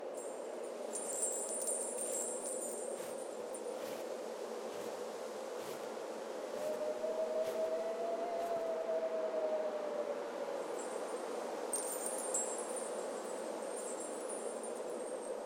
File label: Ambience Winter Loop; clouds sheep; android